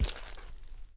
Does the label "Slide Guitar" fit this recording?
No